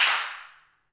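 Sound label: hand clap; Roland L-CDX-01; Clap & Snap; Clap Modules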